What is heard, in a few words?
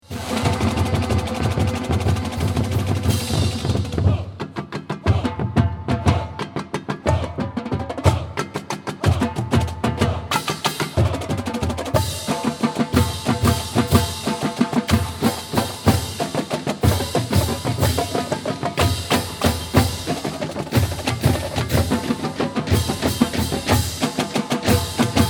Drum Feature